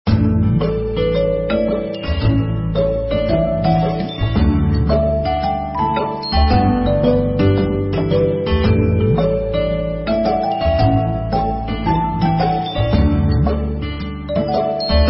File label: bgm